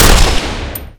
m249 fire; weapons

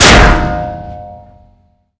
shovel hit

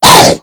pain, mummy

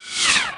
bullet L to R slomo, near miss; weapons

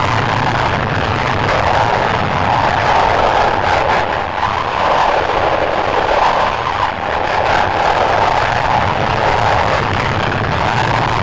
jet, thrusters

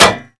hammer strike wall

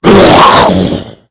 die, mummy

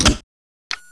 charge, weapons; msbomb

